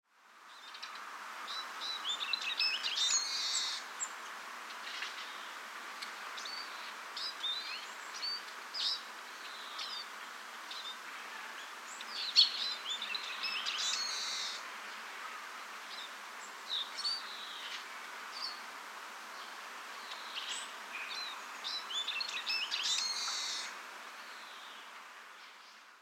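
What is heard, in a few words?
Eurasian Siskin, saitama; mahiwa; koe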